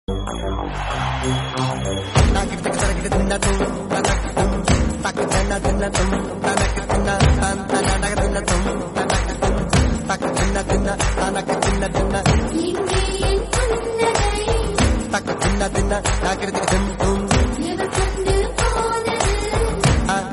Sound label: Western Taalam